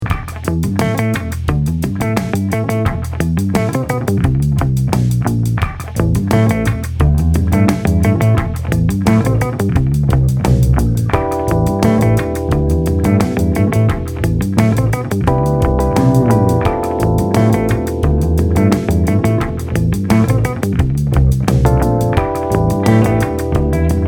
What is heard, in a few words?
Funked Up